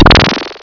Prout...; Realmz 4.2.2

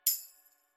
clic; MOBICLIC 95; ZOOMZOOM

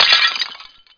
assiette

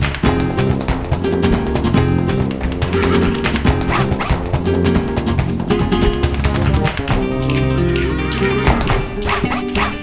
muse spa; MOBICLIC 14; europe

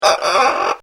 PERROQUET; MOBICLIC 72; CPLUSCLAIR

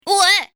Rati; MOBICLIC 71

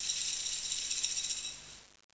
ouverture

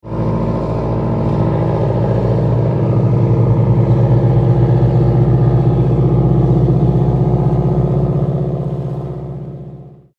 moteur

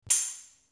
CLIC ETOILE

